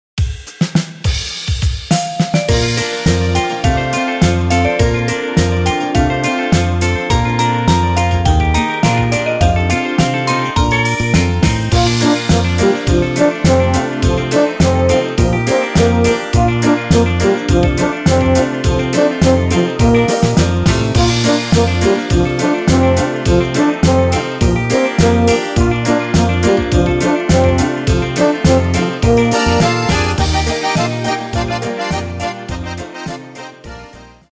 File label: plus b dur alto